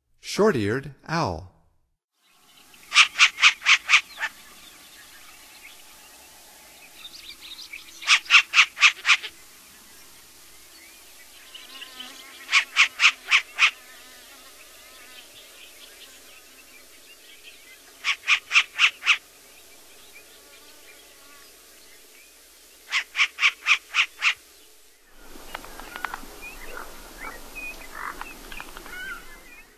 Short-eared Owl; North America; Stokes

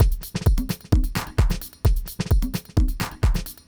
its jazzy 130

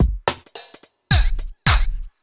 clapper 108

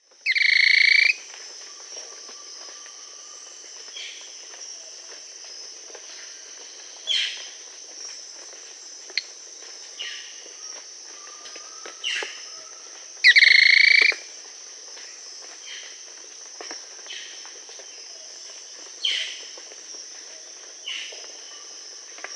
Tody-Tyrant (Hemitriccus iohannis), Peru; Johann's Tody-Tyrant; S. America

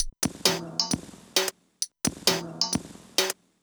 HiHat Perc Loop, Dance Beats

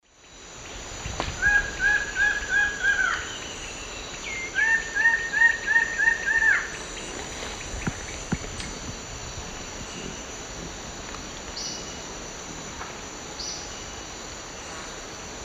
Thamnophilus schistaceus, duet; S. America; Eastern Lowlands

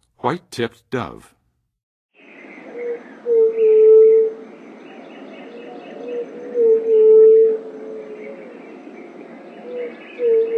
White-tipped Dove, Birds of North America; Western USA; Western USA Songbirds

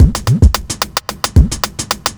block rock kit

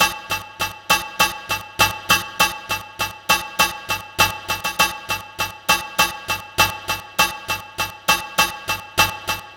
Metallic March, Electronica, Solo Beats

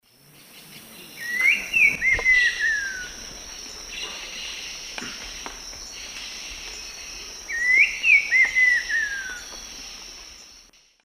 Saltator grossus, song; S. America; Eastern Lowlands

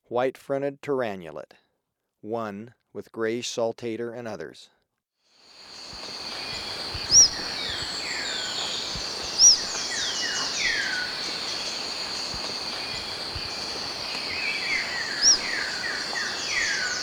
White-fronted Tyrannulet, Ecuador; S. America

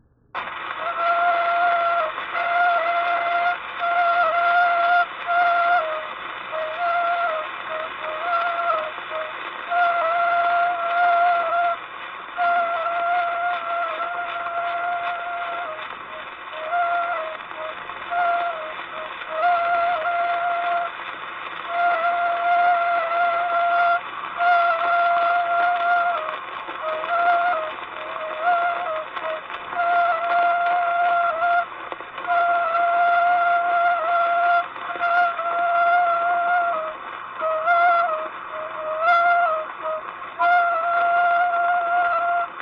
Nose Flute, Hawaiian; World Music; Nose Flute #2; Na Leo Hawai'i Kahiko - Voices of Old Hawai'i

